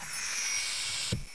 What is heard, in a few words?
open